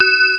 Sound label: bell harm.